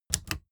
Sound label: typewriter